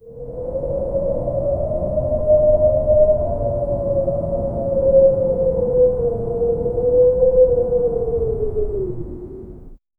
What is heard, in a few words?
sound effect the sound of, blowing